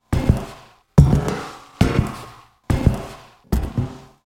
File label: Footsteps on metal surface boots walk